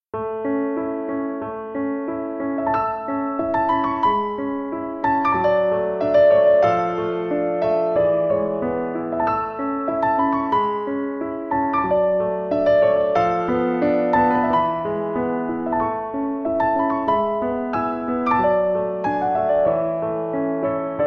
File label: korean pop; neizvestnii instrumental